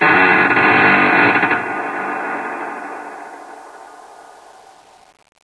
distant creak; dm cavernous

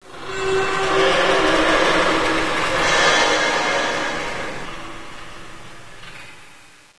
long ambient stress

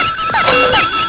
ship noise

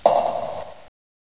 drip; cs valleyspa

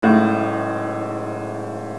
piano

